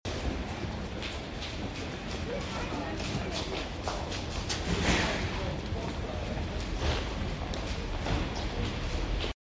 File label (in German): Schritte